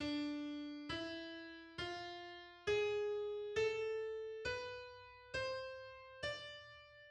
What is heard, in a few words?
Misheberak scale on D